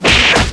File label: FIST HIT; Total Control - Action Cutz